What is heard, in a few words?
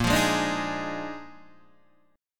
Bb 11th, Guitar, Open D